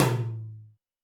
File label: Tom Hi Plate, Alesis D4